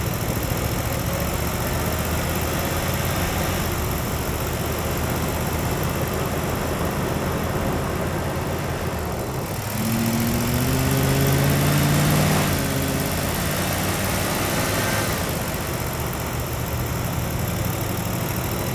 vehicle classic drive

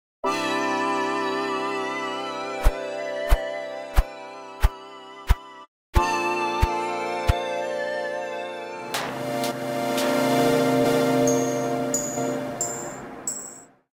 gameplay interstitial

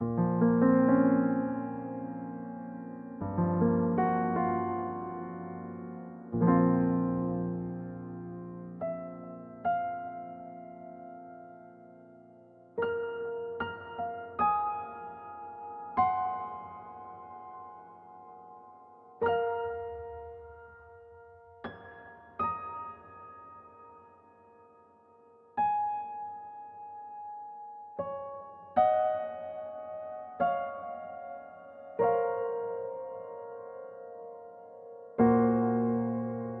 Phrase Piano

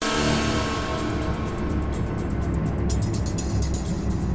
Low Music; Alien Breed Trilogy; PS3; Xbox 360

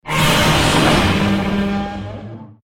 Godzilla Roar Long; Godzilla Smash3

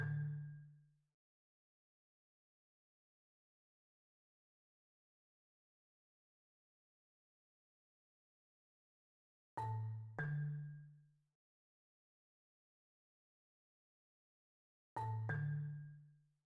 Marimba Part A 94bpm Loop

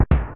Thwomp; The Great Empire Allstars Collection

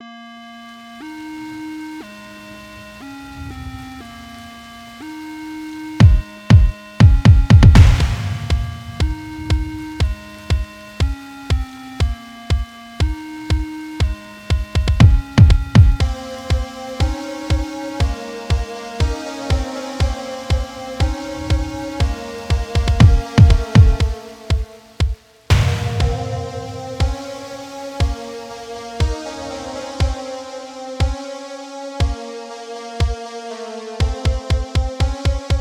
Instrumental; friday night funkin vs. xe ost pc mod gamerip 2022; Shockwave

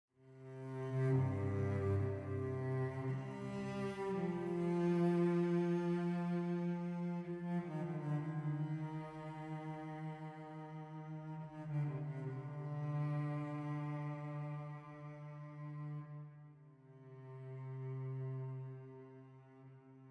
Stinger Gameover Negative Stems Celli

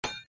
sound Hit

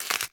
Pickup; Pinguinos Biri Game; Android

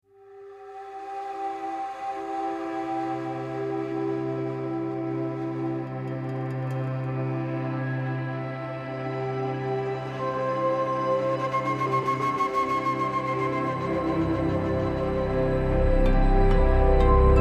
Exploration Sunrise Cello; Horizon Zero Dawn

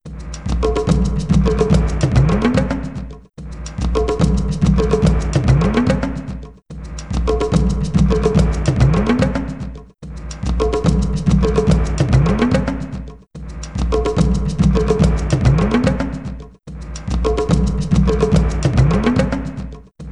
Drumming - Random; betrayal in antara

